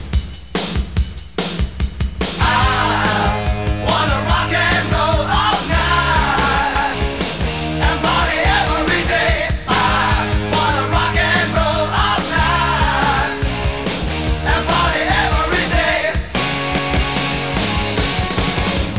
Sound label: roc&roll